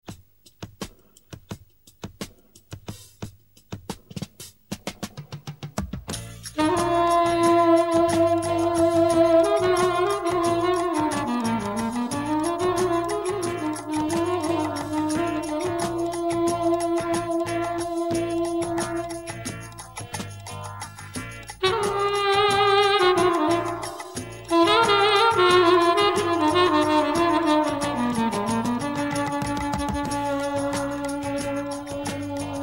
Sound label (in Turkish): Saksofonla Oyun Havasi